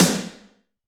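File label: Snare Arena, Alesis D4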